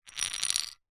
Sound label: leisure poker dealer button chip spin hard surface; zapsplat